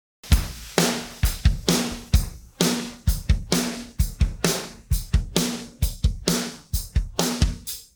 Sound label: pattern, drums